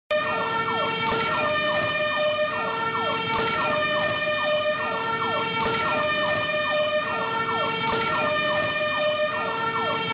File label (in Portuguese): sirene bombeiro